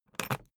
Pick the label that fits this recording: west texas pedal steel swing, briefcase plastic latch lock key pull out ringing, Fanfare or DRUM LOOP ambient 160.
briefcase plastic latch lock key pull out ringing